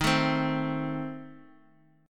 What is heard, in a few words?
A Diminished, guitar